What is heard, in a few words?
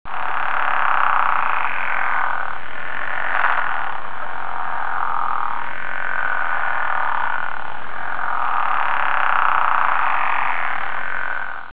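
pickerel frog; frogs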